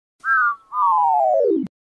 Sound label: wonky broken whistle; Thomas